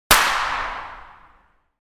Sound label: Pistol shot distant perspective